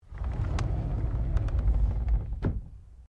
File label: Drawer Interior Wooden Bathroom Large Close; Various domestic drawers; Household